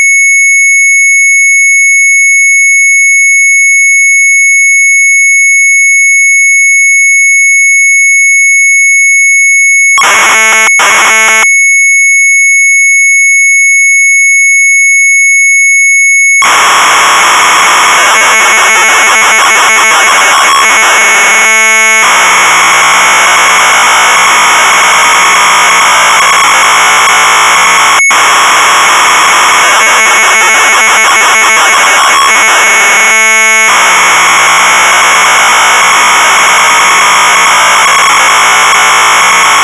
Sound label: MZ800 Tape, sharp; Battle game1; retromelsarchive